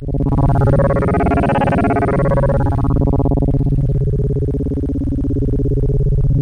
C#, Synclav FM; Big Fish Audio; SPACE1

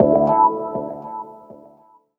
D&B Keys Stab; neuro

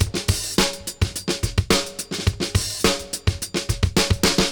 Tronix 106; Zero-G; Monster Beats

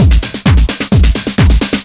dougal roll, Deep House 130bpm; Zero-G Deep House

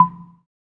SAND, Drums; Distored Reality 1&2

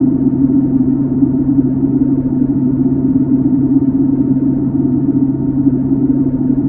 SUB WAVE, Special FX Twisted

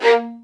XLD2 A#3, LOUD VIOLINS; Q Up Arts; Denny Jaeger Master Violin Library

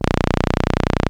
ARP 2600 B1, synthesizer; Hollywood Edge - Giorgio Moroder Rare Synthesizer Collection

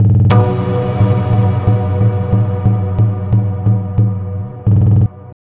Tabla, 90 BPM; Zero-G; Pure Tabla

